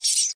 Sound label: rat